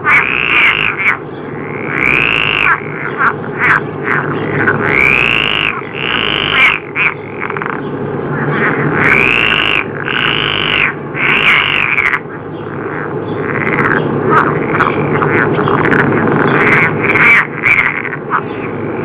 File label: epeirotica, waterfrogs